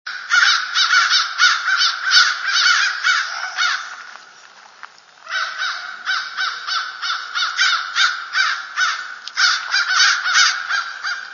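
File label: owl great horned crows mobbing; birdsongs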